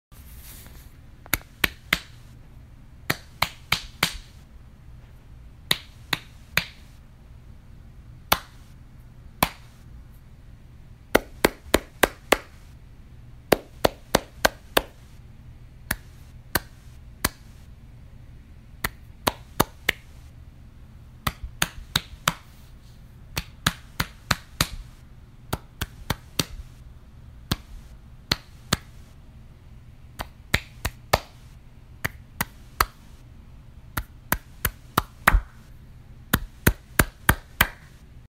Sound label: Hitting stomach